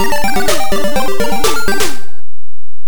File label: Game Over; Batman: the Movie. Game Over; CNGSoft